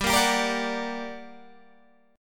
G Minor Major 7th, down, Guitar, Open G

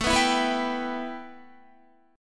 A 7th Suspended 2nd Guitar; A7sus2; strummed

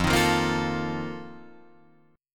F 11th F, down, Guitar Drop D

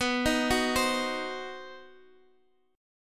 C Diminished B, Cdim/B chord; arpeggiated; JGuitar

